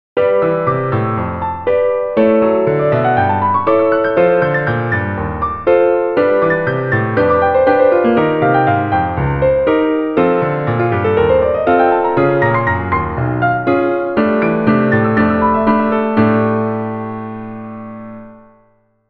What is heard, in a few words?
piano